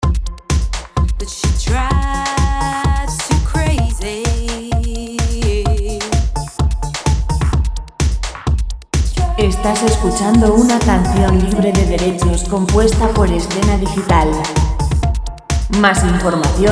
128 vocal dance; dance background music

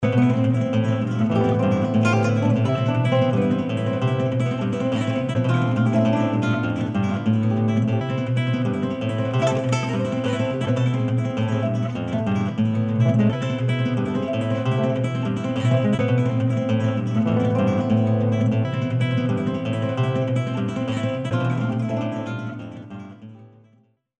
musica spanish guitar classic

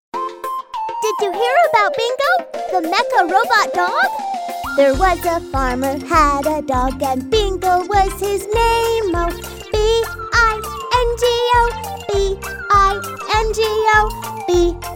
singer english american cantante estadosunidos

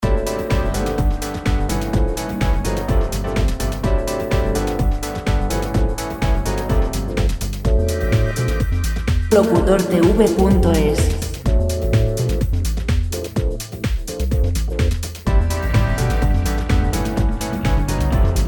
126 dance

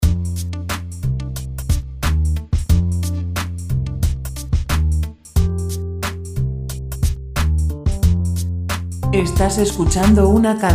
90 lent, relaxing music; relaxing music royalty free music musica sin copyright; baladas

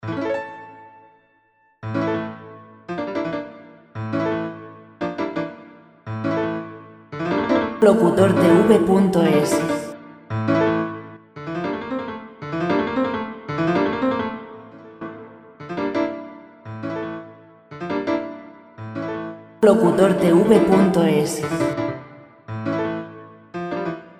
170 guitar folk; Albeniz

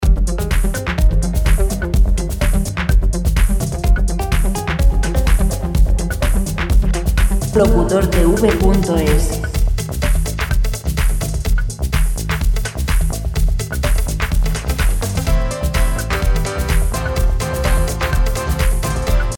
126 dance

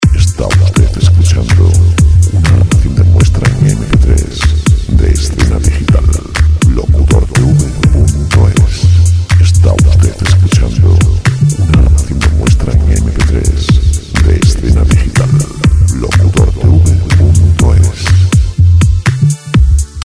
dance

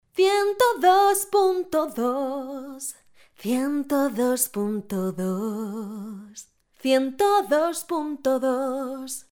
1022 dial cantando jingle spanish singer; Dial cantado

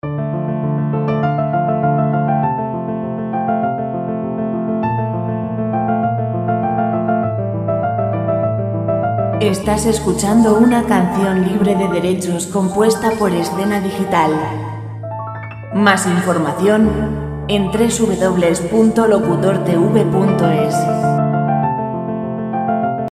100 piano; piano musica libre

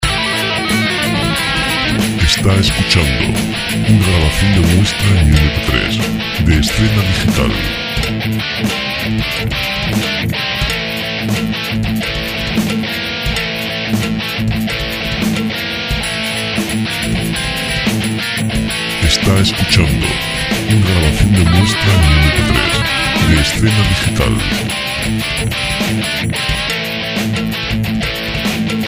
ambiciones 90 rock; Música de rock

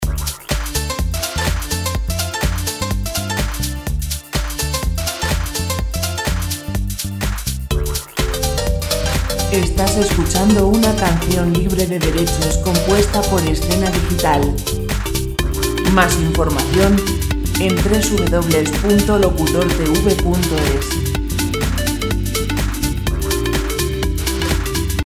125 dance

